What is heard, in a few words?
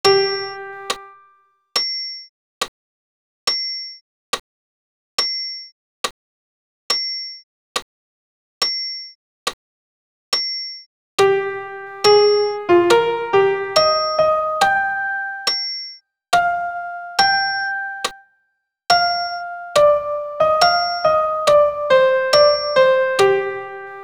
Christe sop pian seul